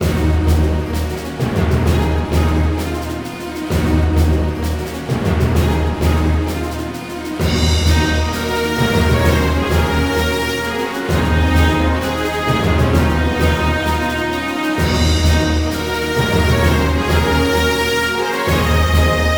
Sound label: Harmonic battle; gamerip; symphony of war the nephilim saga